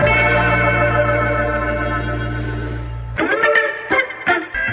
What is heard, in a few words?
guitar, loops; Phonky